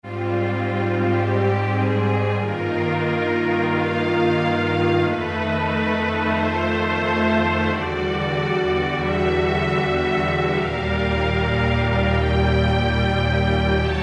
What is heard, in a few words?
Ensemble - Percussive, Roland EM-10